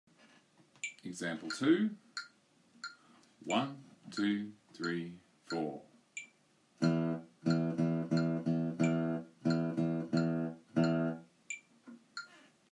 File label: Rhythm; Grade 2